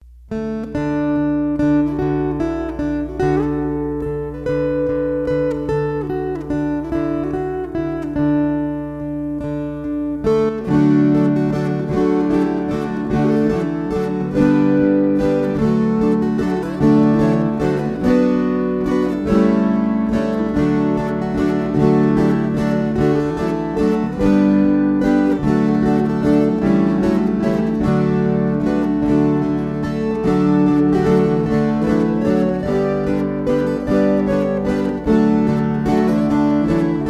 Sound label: Waltzes